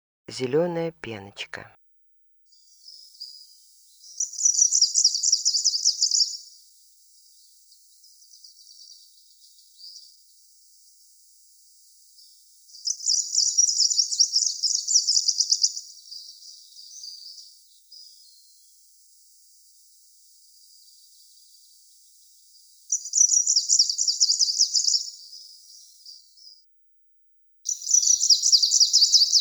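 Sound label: zelenaya penochka golos, greenish warbler voice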